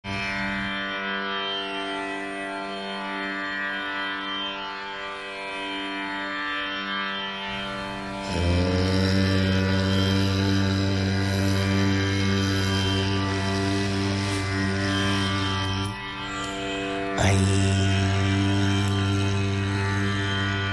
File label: Durga mantra; indian epics and stories